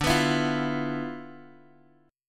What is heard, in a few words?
D Minor 6th Add 9th Guitar Open F; Dm6add9 strummed; JGuitar